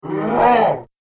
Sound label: The Two Headed Monster; Andrew Fleming